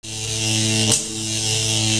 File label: electric; quake2